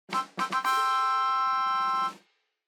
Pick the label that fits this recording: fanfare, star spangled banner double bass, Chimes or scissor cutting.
fanfare